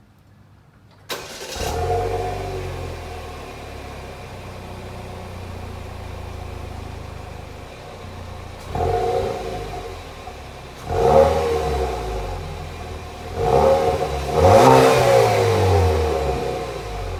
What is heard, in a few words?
chevrolet camaro 2.0t